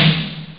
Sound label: snare tom; rock'n'roll; ST-XX Amiga Soundtracker Sample Packs